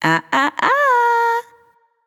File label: nv disagree; smite; awilix